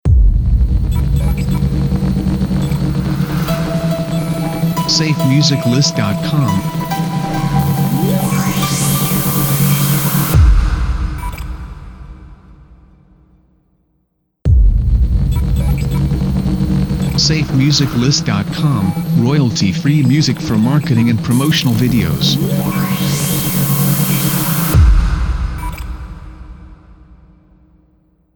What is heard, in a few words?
Hi Tech Logos; SafeMusicList